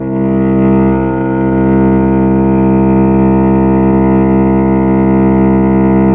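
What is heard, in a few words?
Mellotron Cello C3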